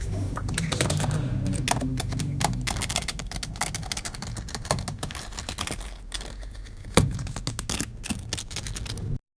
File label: making money money money, finance